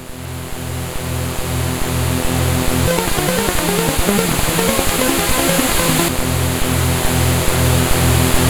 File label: Short c64 tune, sid CSG8580R5